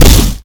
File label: shoot; tacz; RSWS 1903; sks